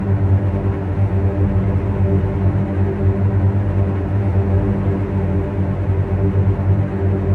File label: Monastery Attic String Trem Loop; Silent Hill Downpour